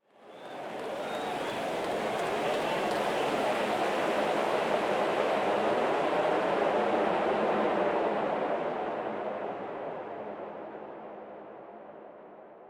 Crowd Ambience; Pitch Black Audio Pong

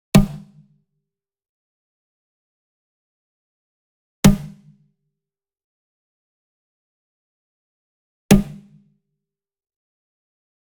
Wood Percussion Wood Drum Mounted Hit Whip Near; boom library; Cinematic Strikes Construction Kit

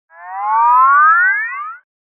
Comic Slide Long Going Up; Hollywood Edge; sound designer's toolkit v2

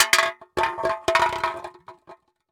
FOLEY CAN DROP EMPTY ROLL ON WOOD TABLE; blindside

